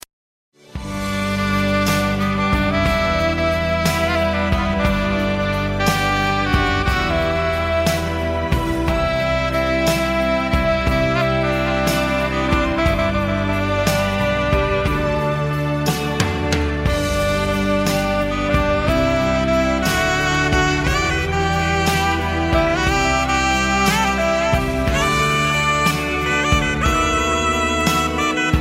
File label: sax